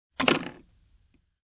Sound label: spring